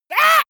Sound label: monster assault high; schreie